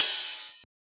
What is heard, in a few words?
Ride; FLPRO